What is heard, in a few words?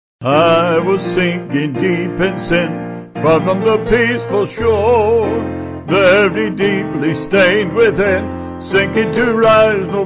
Vocal Piano; I Was Sinking Deep